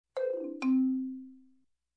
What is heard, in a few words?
Marimba short fall